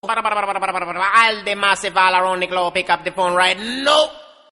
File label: Pick Up Ragga; meloboom